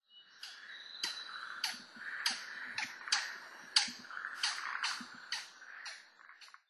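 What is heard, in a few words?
White-backed Woodpecker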